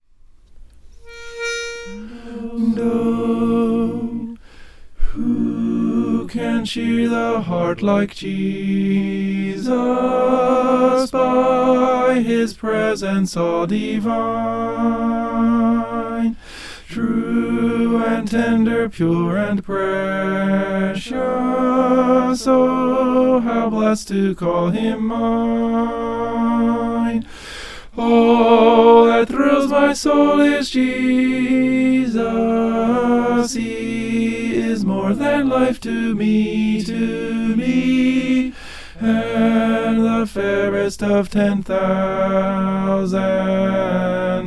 tenor

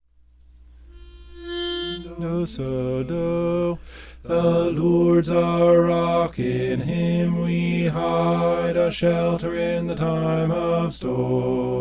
bass

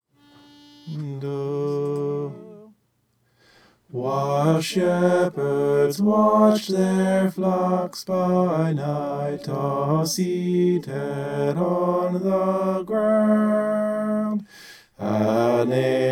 bass

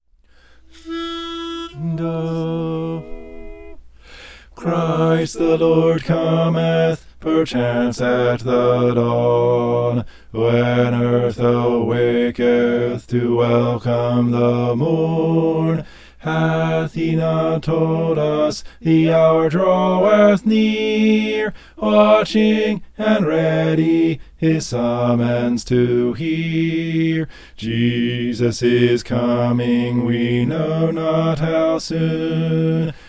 bass; christ the lord cometh

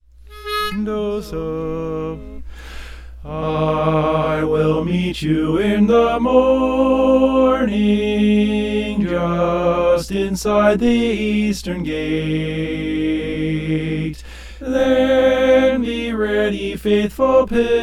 soprano

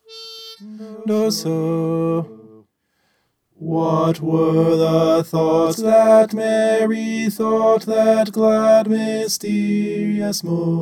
tenor

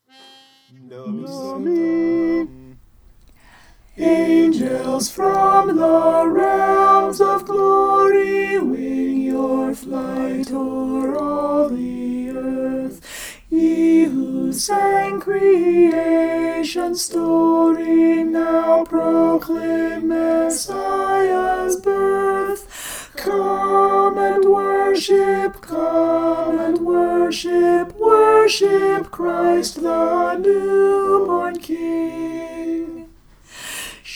alto

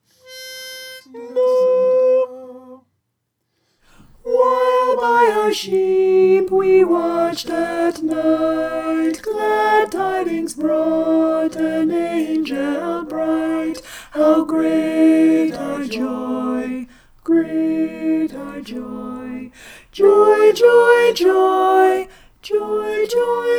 alto

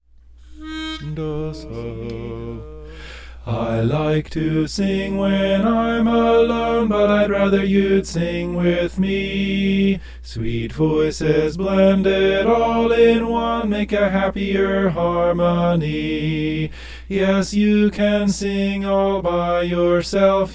soprano

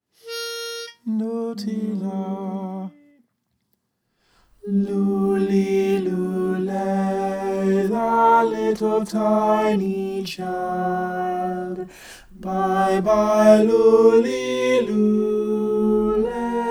soprano

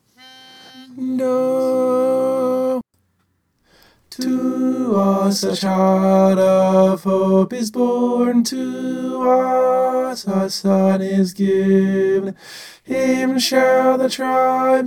tenor

